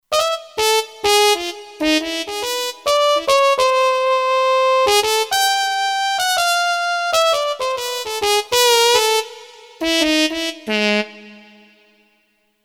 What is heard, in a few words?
Almost Muted, Kurzweil K2500X; Factory Programs